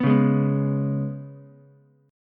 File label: Ab Minor Double Flat 5th Ab down Ukulele; strummed; JGuitar